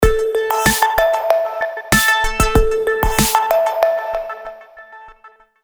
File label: Primary, ringtones; Alcatel; 5049W-T-Mobile-REVVL; FX4-Remix1